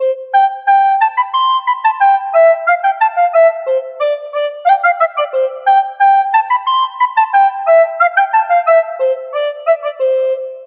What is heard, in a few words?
march